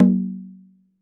Tumba Med Hi; Sonic Foundry (Sony Creative Software); Joe Vitale Latin Percussion

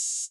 H Opn, Hi-Hat, Yamaha DTXpress

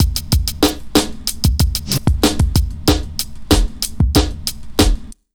BEAT L, Pure Electro; USB Soundscan vol.03; AKAI

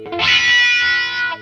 DIRTY WAH, Distortion Gtr; PlatinumPhattCD2; E-MU Producer Series Vol. 8 – Platinum Phatt

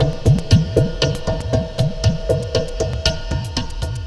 Terrestrial Hybrid, Processed Percussion; Sonic Foundry (Sony Creative Software); Rhythmicronics

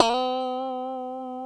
C#4 FUNK, STRAT, Guitars; Spectrasonics; Hans Zimmer Guitars VOL-1; 90's Sample CDs

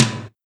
TOM, Eurotech; Sounds Good

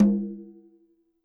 KILLER CONGA

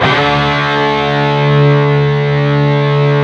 PRS, Guitars, PWR SLID

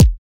KICK

